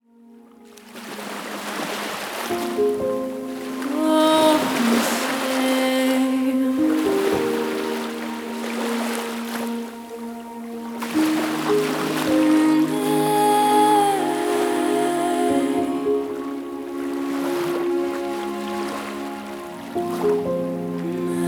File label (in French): Triste piano musique, Piano relaxant